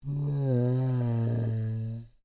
Created Creature Large Exclamation, Alien Sound Effects; Created creatures, vocalisations and pretend speech